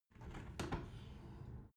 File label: window open tilt heavy sequence